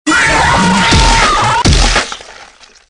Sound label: pizza jumpscare sound